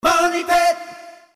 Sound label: Punch